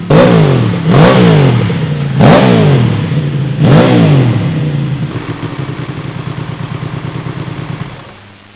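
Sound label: Ducati rev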